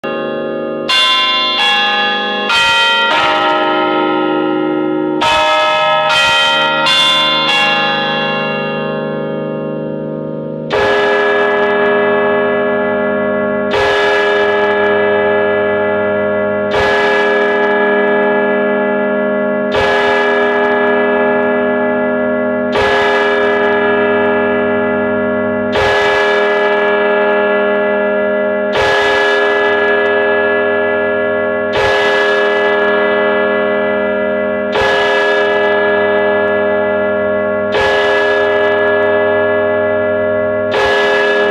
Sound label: bell